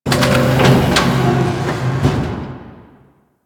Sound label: Clunky Machine; Ambience; Warframe; Corpus Railjack